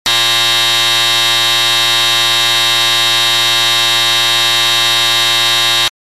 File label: buzzer; クイズ